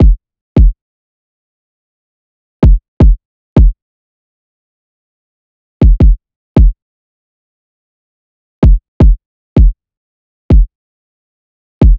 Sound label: Kick 160, Trap Drums; Stems; Achieve Drum Kit